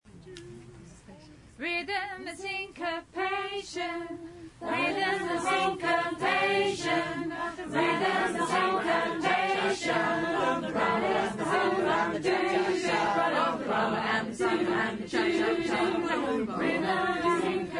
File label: Rythm And Syncopation